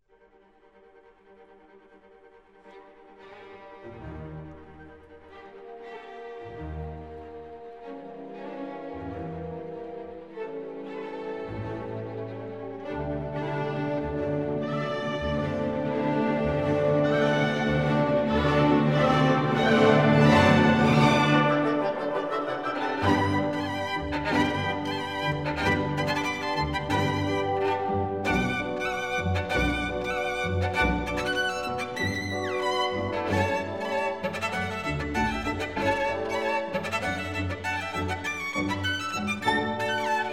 Violin Concerto

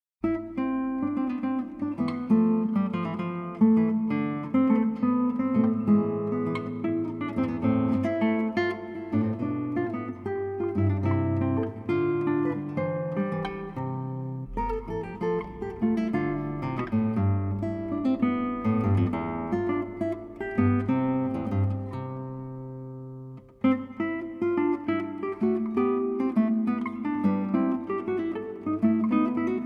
Guitar Suite in A Minor Giga; Johann Sebastian Bach

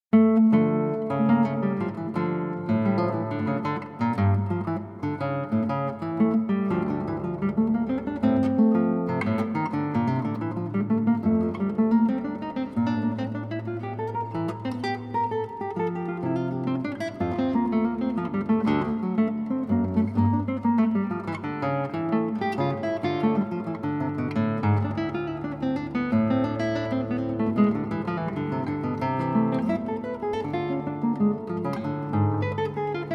Corrente (Guitar Version); Johann Sebastian Bach

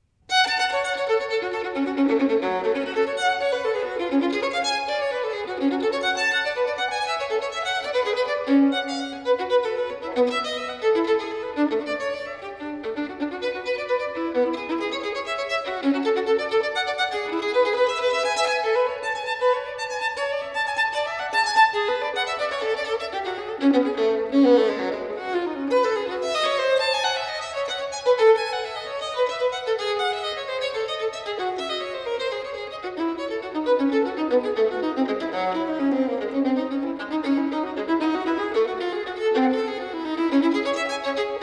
Sonate nr.1 in G minor BWV 1001, Violin Solo; Bach; Sonatas & Partitas for Violin Solo

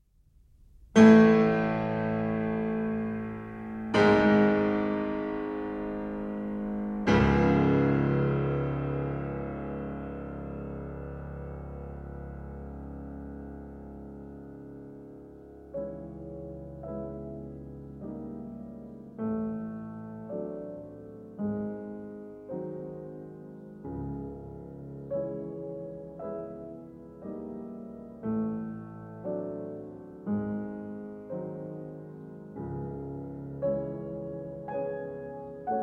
Prelude in C sharp minor, piano; 24 Preludes

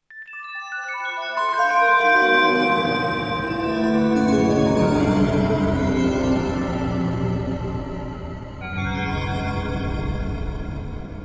Bell Threshold; Exeter

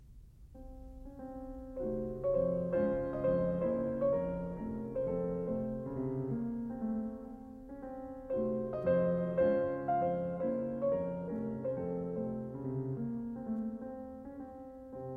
Prelude in B flat minor, piano; 24 Preludes

